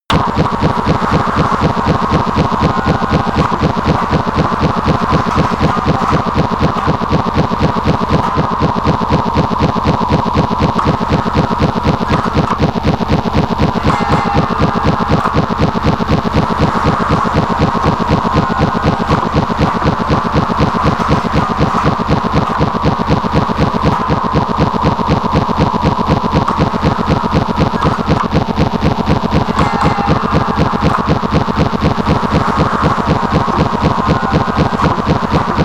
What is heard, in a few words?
atmosphere industrial hypnotic high